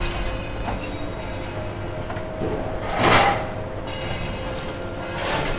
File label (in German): stuttgart schrottplatz